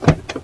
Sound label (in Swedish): baklucka open; aomdc